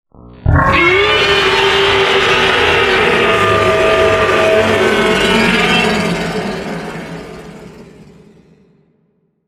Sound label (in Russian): rev drakona